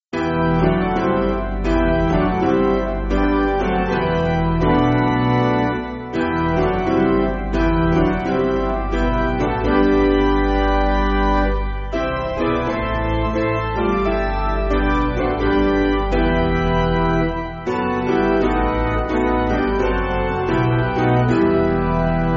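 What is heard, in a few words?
Piano, church backing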